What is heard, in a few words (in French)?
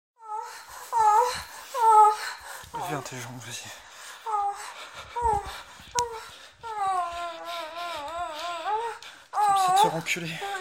Orgasme